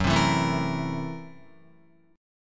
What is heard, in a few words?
Gb 7th Sharp 9th Flat 5th Gb Guitar; strummed; Gb7#9b5; 2,3,2,2,4,5; JGuitar